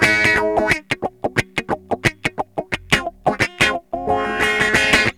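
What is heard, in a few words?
guitar riff, Funky Guitar Licks; Hip Hop; E-MU Formula 4000 Series Vol. 1; Hip Hop Nation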